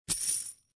coin

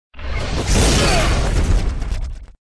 boss Die

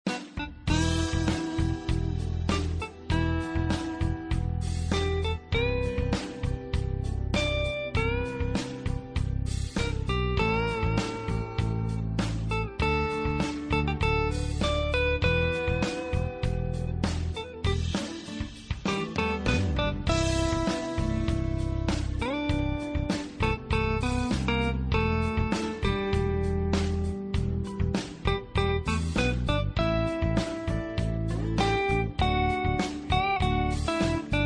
bgm

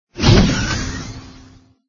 change Gun

